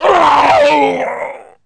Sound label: BLUE DIE; Messiah